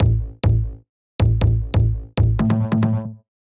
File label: Bass Riff A, Grime, 138bpm; Zero-G Sound Sense Grime Scene; Construction Kits; Da Suspects